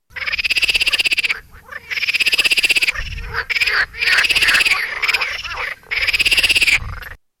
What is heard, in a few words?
squirrel chattering